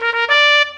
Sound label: attack result win